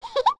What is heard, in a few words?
Baby Goron Giggle; Baby Goron giggling (3 pitches)